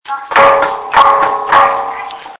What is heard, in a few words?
temple bell